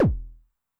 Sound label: BD MOD [Pitch+Depth], 909mod, BASS DRUM